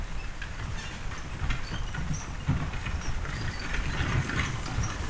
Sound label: Horse Drawn Wagon By; Electa Quinney